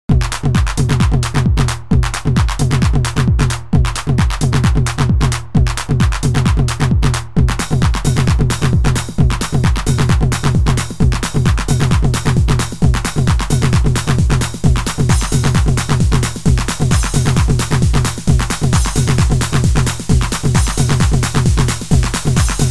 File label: midtom, 909